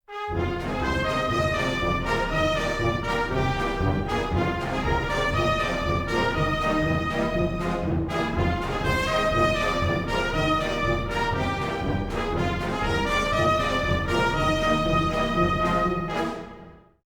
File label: Win Sting; PS2; PSP